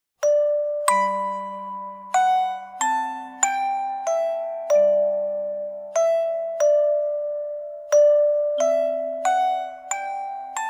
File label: Melody Simple